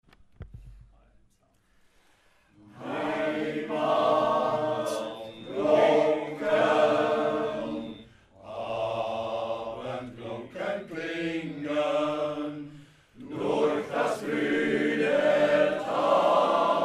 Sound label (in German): Glocken; Heimat